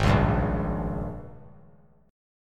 G sharp Major 7th Suspended 2nd Suspended 4th G sharp, down; strummed; chord